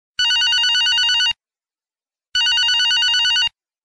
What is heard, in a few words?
simple bell